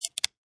Pistol Revolver Hammer Cocked; Gun Handling

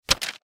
Folder Slam On Desk Set Down; Office; Objects; Blastwave

